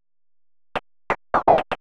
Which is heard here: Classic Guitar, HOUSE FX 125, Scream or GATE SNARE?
HOUSE FX 125